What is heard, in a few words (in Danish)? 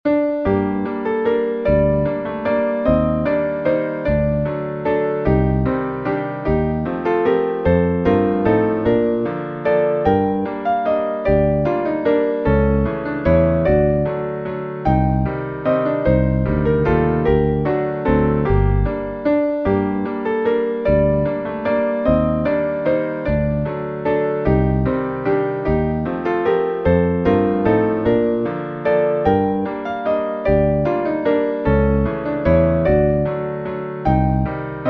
Vals